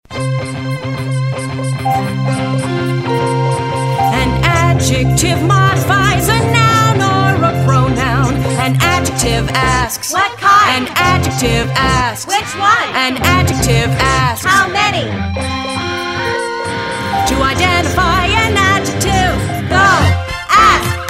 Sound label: The Adjective Jingle